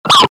guitar cut

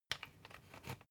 usb port metallic soft in

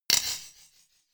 drumstick hit clank hard

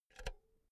insert cinch

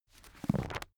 book browse smooth

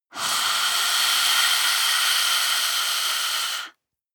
breath female eerie hiss

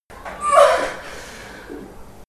birth mother moaning

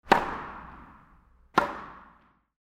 indoor tennis serve

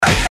fist hit

